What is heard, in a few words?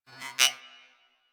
Beep Comby; Warframe; Ambience; Corpus; Beeps